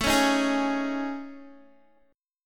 A Major 7th Flat 5th, Guitar, chord; AM7b5 strummed; JGuitar

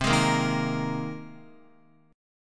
C Minor 7th Sharp 5th C Guitar; Cm7#5 strummed; JGuitar

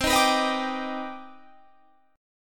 C Minor Major 9th C

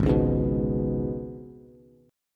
G sharp Minor Double Flat 5th G sharp, Bass; G#mbb5; strummed; JGuitar

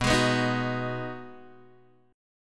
B 6th B down Guitar Open G

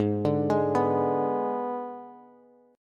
Ab Diminished Ab Bass; Abdim arpeggiated; JGuitar

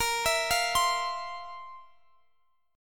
F Major 7th Suspended 4th A sharp

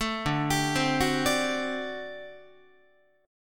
D 11th D, down, Guitar; D11 arpeggiated; tuning 4A,4E,5A,5D,5G#,6C#

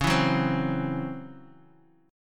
Db Minor Major 7th Double Flat 5th Db Guitar; 8,7,6,5,x,x; 4F,4A,5C,5G,5C,6E; strummed

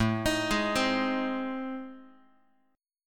A Minor Major 7th Flat 5th A, Guitar; arpeggiated; AmM7b5; x,5,6,6,4,x; JGuitar

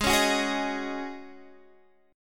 Ab 7th Suspended 4th Ab down Guitar DADGAD

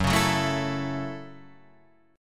A 6th Gb

